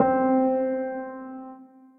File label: Reflectere Piano, multisampled; Instruments; Broken Keys; Ableton; Factory Packs; Mood Reel